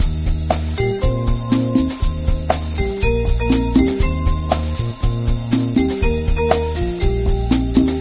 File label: Cafe Music; Diner Dash 2 Restaurant Rescue